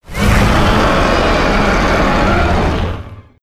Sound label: roar; spino